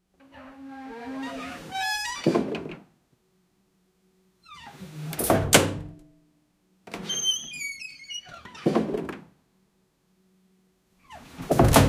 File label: glass door opens suddenly; doors